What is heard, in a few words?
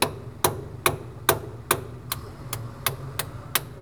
Hammering, INDUSTRIAL